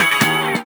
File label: Synth Sound; Synth Shots; Vengeance Samples